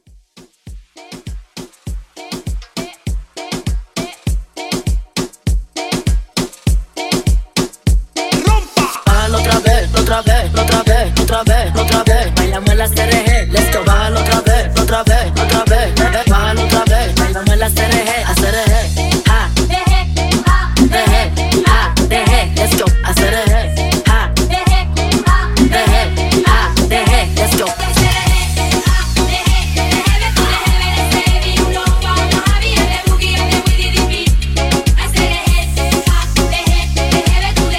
Moomba Quick Edit

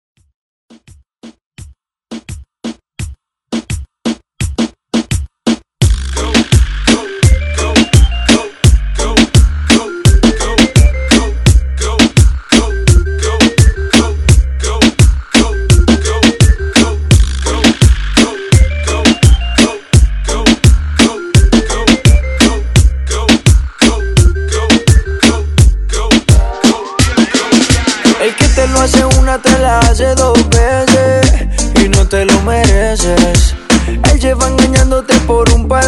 Reggeton; Redrum

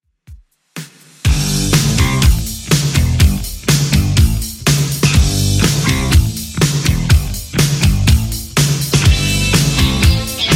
80s Redrum 123bpm

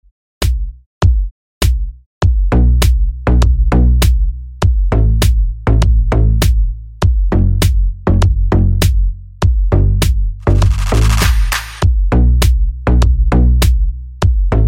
Top 40 Redrum

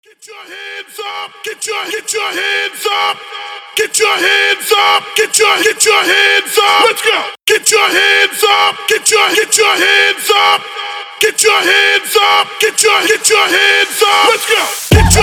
Acapella In Bigroom Bounce 129 BPM; Rebuild

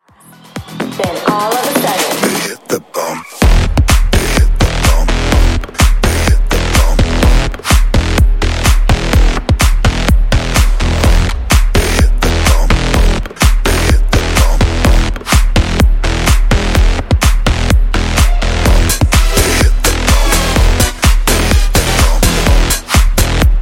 Funk Bass Remix 126 Bpm

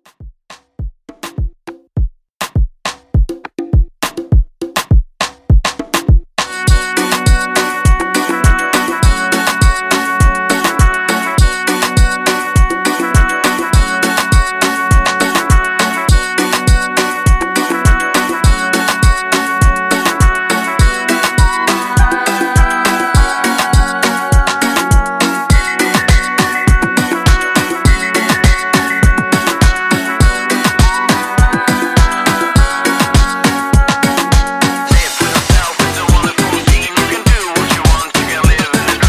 90s Dance Afrobeat, 102 Bpm

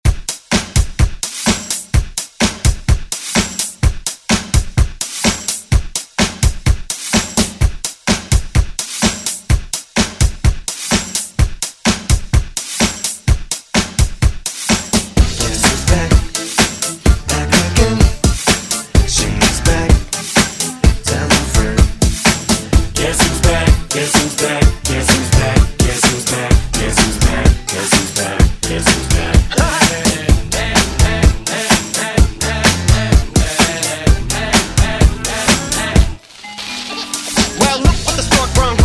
Pop Redrum Clean

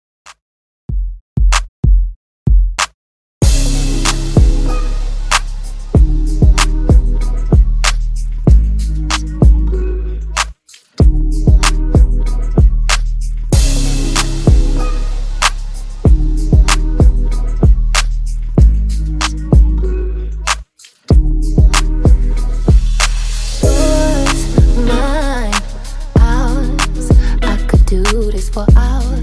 Dj Intro Outro 95Bpm

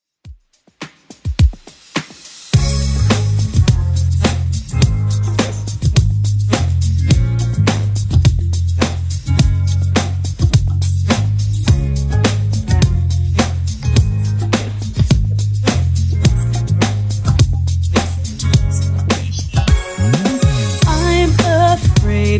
90s Redrum 105 bpm

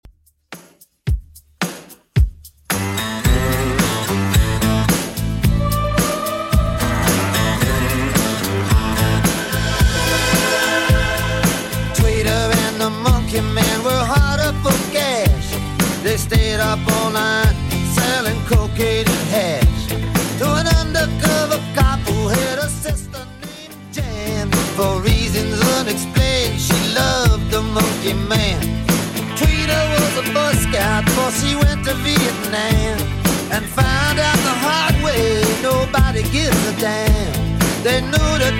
80s Redrum 110 bpm

